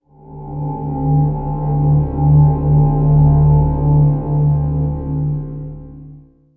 PAD

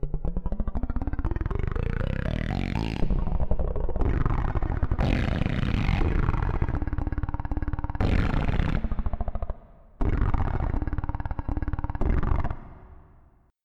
VoxRoller; Onj's Soundfont

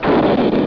B IMPACT; PC Gamer IT CD 31

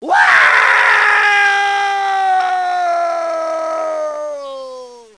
foot; My Science Fair

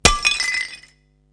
Crash!; Nightfall Demo

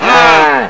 moo

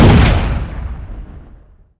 shoot; Big bang

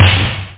snare drum; drums

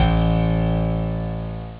piano, pianos

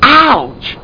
ouch!

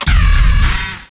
ARCADE; heat

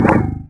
Percu; Revista CD Expert 50; PCGamer50

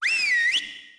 WHISTLE, construction; zones

